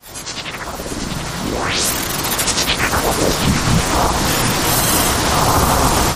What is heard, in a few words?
Frequency Scan